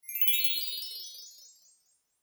Pantomime Fairy Spell, Cartoon Sound Design Sound Effects